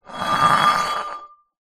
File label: glass bottle wine drag concrete ground; foley